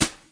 BRUSH SWISH, Roland TD 7; DRUM MACHINES

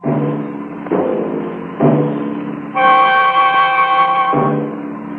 oldtime suspense; ORCHESTRAL and CLASSICAL

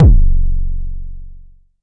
Jomox MBase, drum machine; Jomox MBase 01

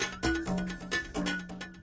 131 bpm, perc, drum loops and breaks; 131 to 140 bpm; samples-ghost

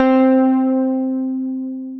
Synthesizer Middle C Notes, Lead

